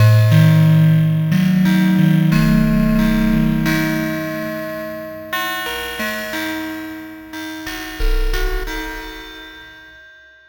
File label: Melody, IDM; Sonic Foundry (Sony Creative Software); Twine-IDM